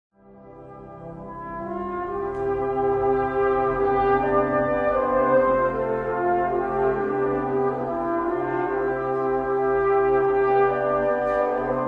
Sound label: brass